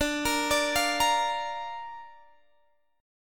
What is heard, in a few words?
D Minor Sharp 5th D Guitar Standard; x,17,20,19,18,18; Dm#5 arpeggiated